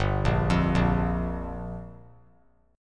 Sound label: G Minor 7th; Gm7 arpeggiated; JGuitar